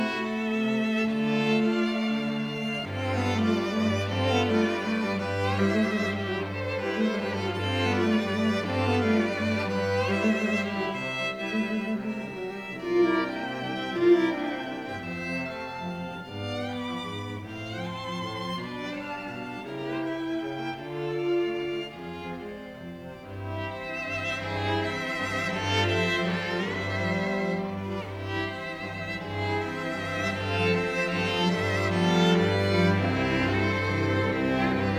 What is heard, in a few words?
String Quartet in D Scherzo